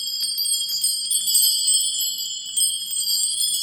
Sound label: IND. BELL, Indian bell